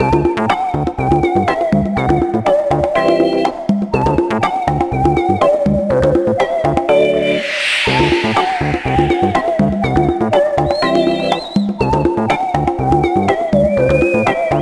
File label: Jungle Loop; Boulder Dash Rocks; DS